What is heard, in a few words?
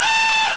sirene; Amiga Soundtracker Sample Packs